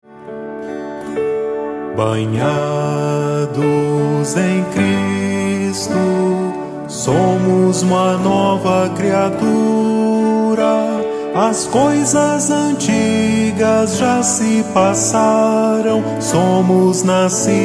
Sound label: soprano